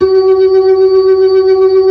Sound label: B-3, Blues